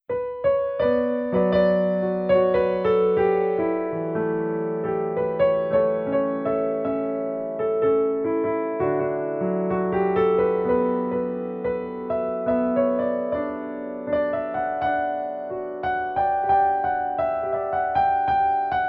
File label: brad semi imp southpark north queensland australia p 515, piano